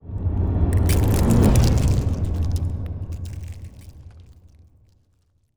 pro ship colossus move; biogenesis

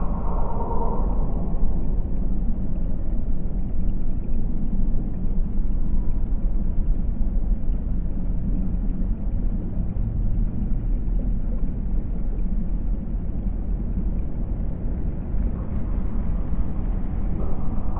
ship colony ship idle; aquatics

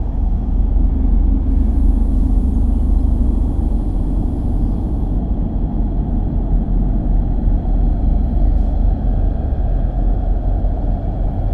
cruiser hum; nemesis; Stellaris

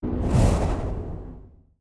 notification hostile fleet; Stellaris

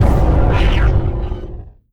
railgun fire; weapons; Stellaris

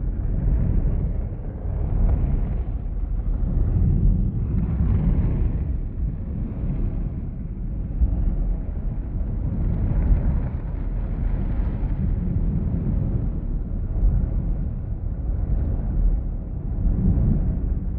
colossus idle; aquatics

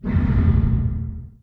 distance laser fire, weapons; Stellaris

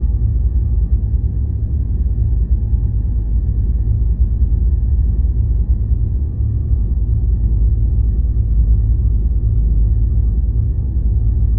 starbase hum; nemesis; Stellaris

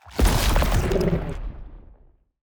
void worms bombardment; Stellaris; grand archive; weapons

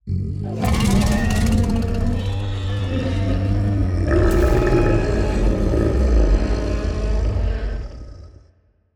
kaiju adult death; biogenesis

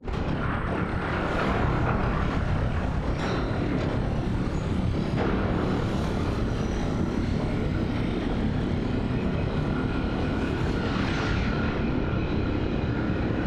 ship colossus open; Stellaris; machineage

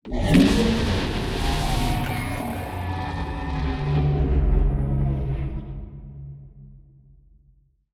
void worms juvenile death; Stellaris; grand archive; ships

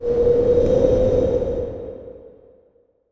tundra planet, ambient; Stellaris

